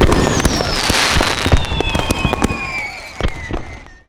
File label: Noisemaker summer fireworks